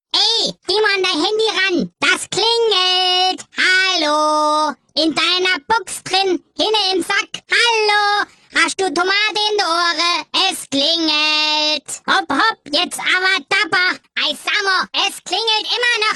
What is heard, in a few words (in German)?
Klingelton; Geh mal an dein Handy ran